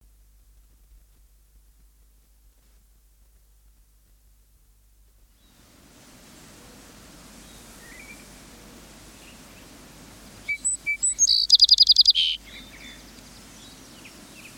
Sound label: song sparrow, songbirds